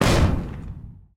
impact tank

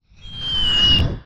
artillery incoming

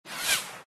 shell whizz

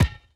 ball kick

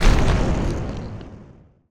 impact terrain

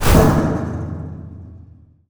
impact player

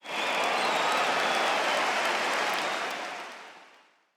cheer kill

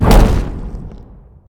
explosion bomb

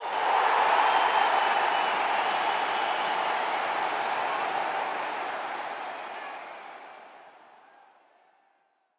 cheer goal

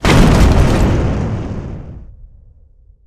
explosion tank

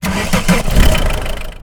car start

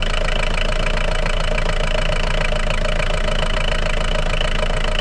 car idle loop

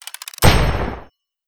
rank up

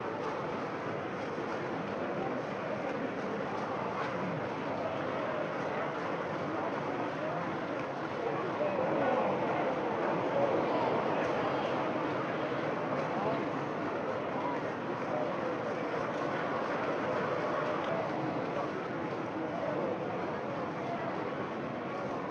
crowd loop

